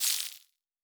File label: Spark, Electric; Sci-Fi Sounds